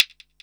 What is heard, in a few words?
JD800 Internal Drums, Roland JD800